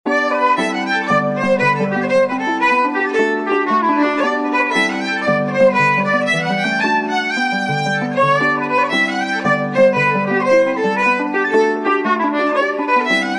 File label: Jig